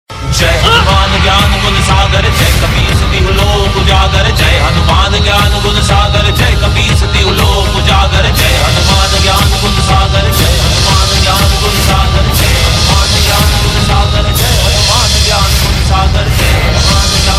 Entry BG Tune; Kapils Fathers; TV Serial Tone; SAB TV; Khatmal E Ishq

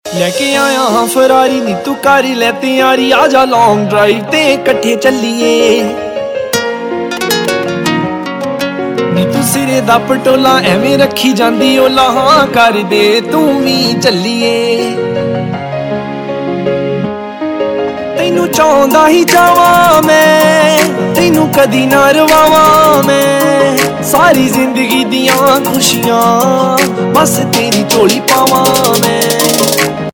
Punjabi Ringtone

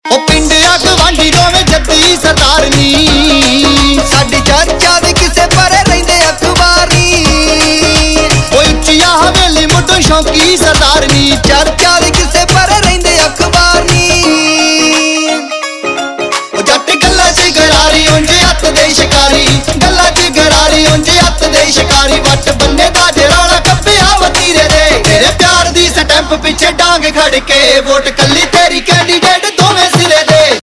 Ringtone, Punjabi

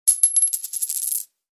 coin falling; 3D Tones

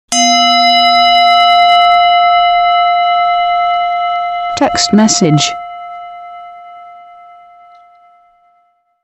Chime Text Message

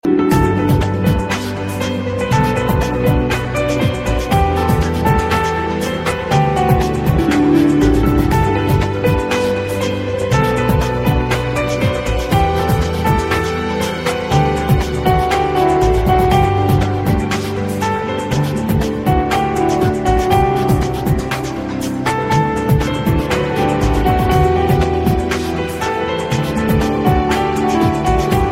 Piano

